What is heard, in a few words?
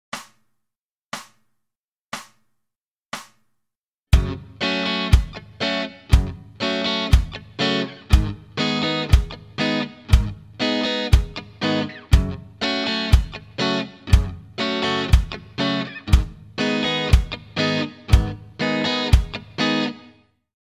Reggae slow